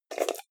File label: jar plastic midsize open fast